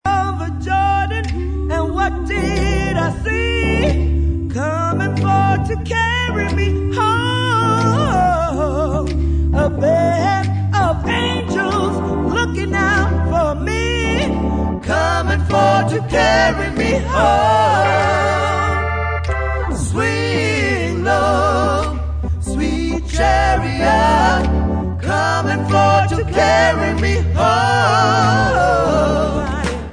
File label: swing low